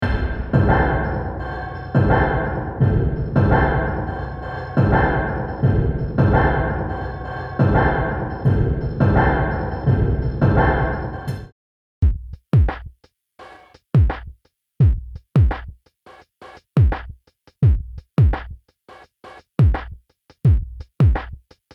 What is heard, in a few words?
Drum Loop EARLY; リバーブの開始時に作用; Temperance Pro